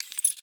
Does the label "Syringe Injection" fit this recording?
Yes